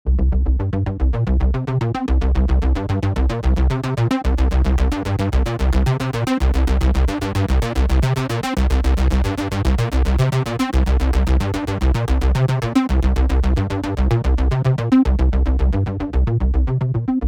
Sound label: Disco Bass; retro 80s synth wave; retro 80s synth wave presets abx3 vol1